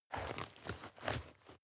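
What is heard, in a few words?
step dirt heavy boots scuff, footsteps; schritte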